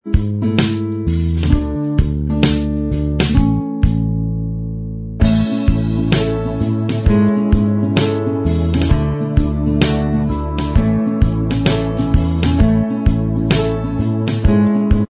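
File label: instrumental, backing track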